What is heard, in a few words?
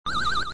Menu Command; Buzz Light-years Away II